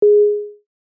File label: short punchy sine wave ding